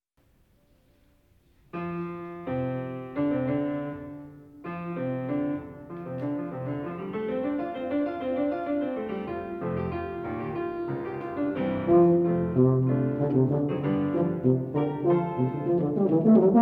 Sonate for Tuba og Klavier

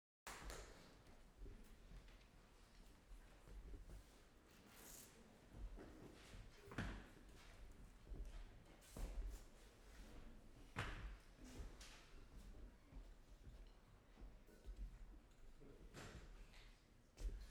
Solo Electric Guitar; Piece for Solo Electric Guitar

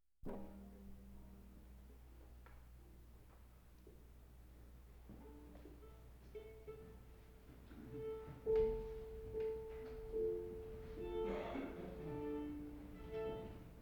Violin Sonata op. 12 no. 3 movement 3; Beethoven

